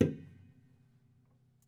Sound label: Prepared Piano